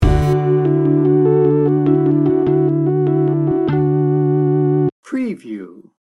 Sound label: sweet and mellow sting bumper